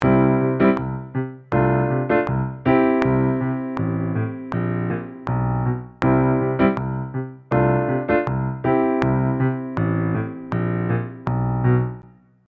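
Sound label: with click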